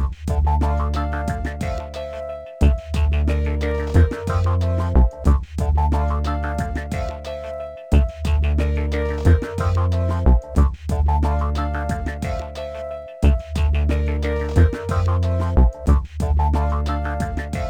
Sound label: menu music; csi